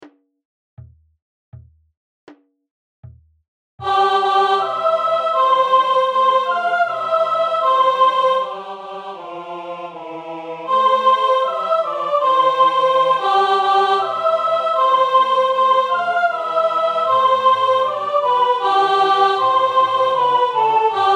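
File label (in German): Sop, Raetoromanische Volkslieder; Domanda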